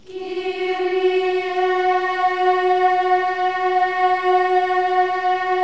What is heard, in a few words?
KYRIE, Symphony of Voices